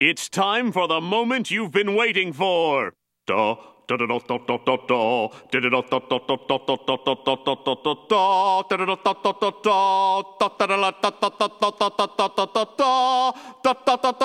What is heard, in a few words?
crescendo; Spongebob